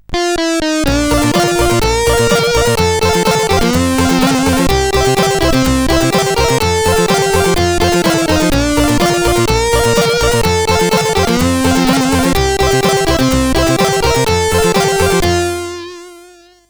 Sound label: Sputnik CSG8580R5